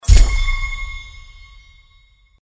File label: UI Normal Pop Up